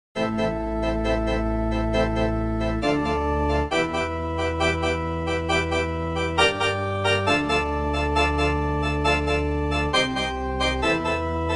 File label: Tutorial Island church organ